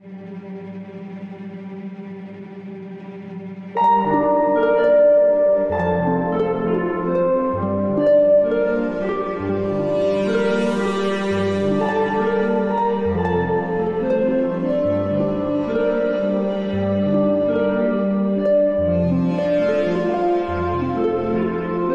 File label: music box; weeklybeats-2014